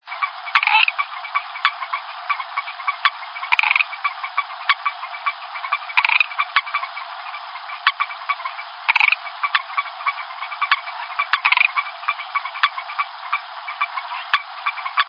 Leptodactylus melanonotus, leptodactylidae

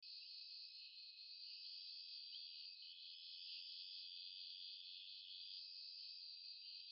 Hypopachus ustus; microhylidae

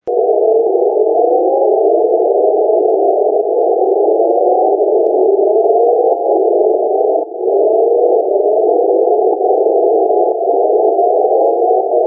Rhinophirnus dorsalis; rhynophrinidae

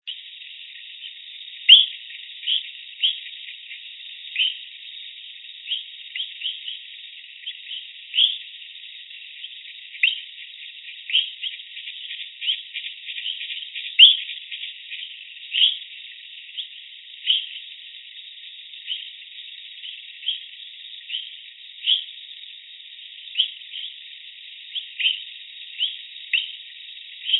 Eleutherodactylus pipilans; eleutherodactylidae

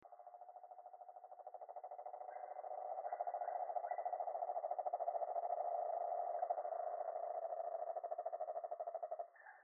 Rhinella horribilis, bufonidae

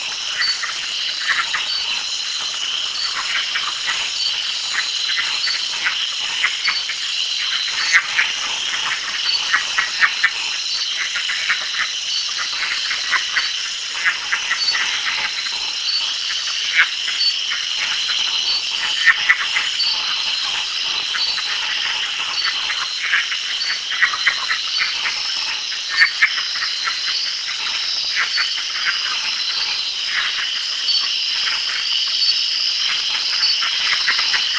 Hyalinobatrachium fleischmani; centrolenidae; S baudini; Hialinobatrchium fleischmanni

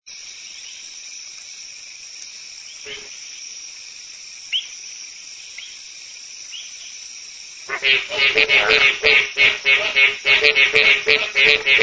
Smilisca baudini, hylidae

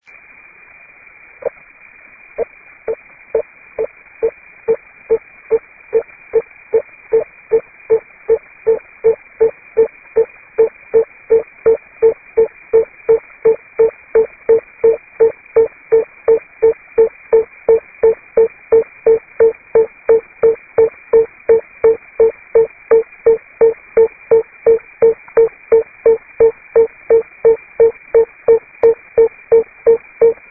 Anotheca spinosa; hylidae

